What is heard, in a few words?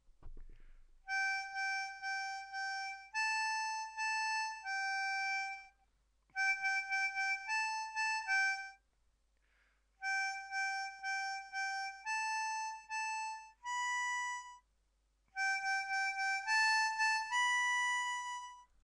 Verse Fill